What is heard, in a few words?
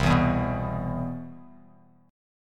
C Major 7th, Bass Standard; CM7 strummed; JGuitar